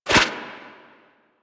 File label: Turbulence Deflect; Warframe; Tengu